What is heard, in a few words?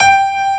piano G5, 2 Step; USB Soundscan vol.51; House Side Of 2 Step; AKAI; PIANOS